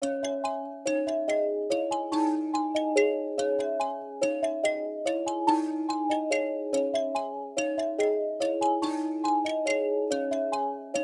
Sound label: 143 BPM C# Min Pluck; Rome